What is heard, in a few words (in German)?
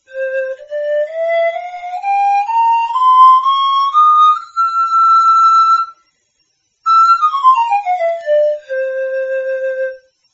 pan klein